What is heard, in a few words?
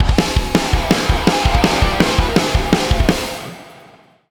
Stinger; Magatha Bane of Music